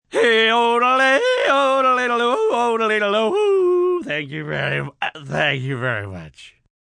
Yodel Slow Drunk, Yodelling, male, sung, slow, drunk, funny, comedic, cartoon (human voice); Funny Sounds